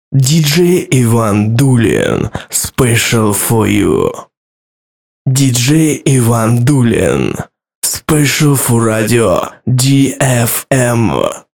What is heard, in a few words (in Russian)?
Пример джингла